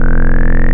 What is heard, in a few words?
Sqr Swell, wavetables; Animoog